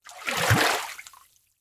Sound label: Liquid Water Water Foot Forced In Water